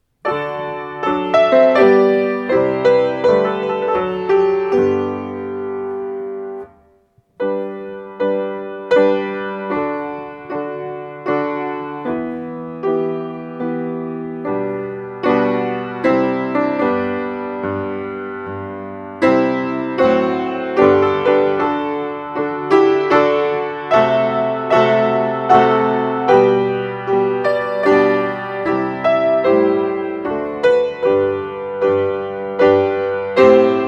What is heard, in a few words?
PIANO ACCOMPANIMENT; Ombra mai fu